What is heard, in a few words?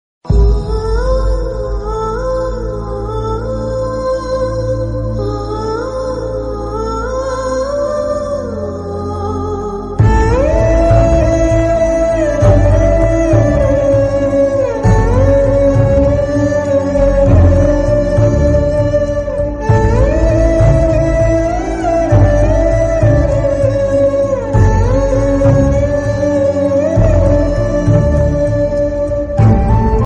emotional bgm movie emotional bgm love